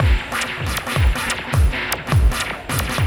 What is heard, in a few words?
Noises off, Synchro Funk, Beats; Sonic Foundry (Sony Creative Software)